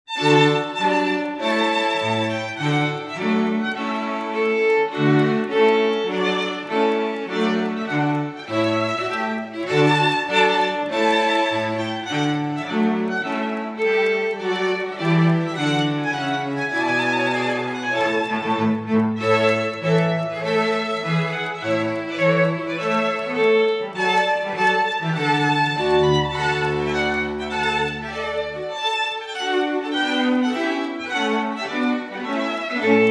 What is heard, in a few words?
trumpet tune